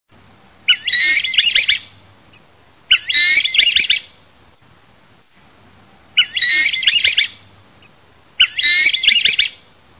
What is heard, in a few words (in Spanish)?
SIRIRI ALA